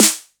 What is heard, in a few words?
Snare; VEC1; Club